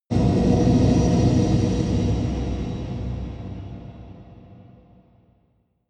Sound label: air turbine storm